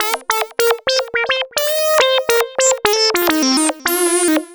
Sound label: lead (brass)